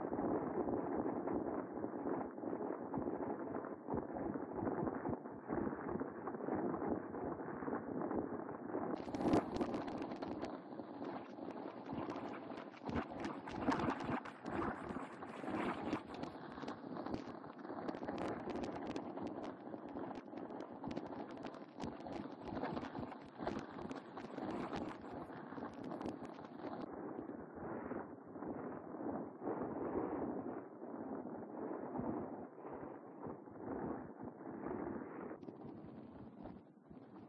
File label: egg proximity; stray; Zurg